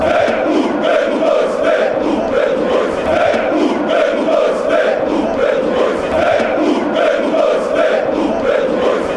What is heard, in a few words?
chant, dinamo zagreb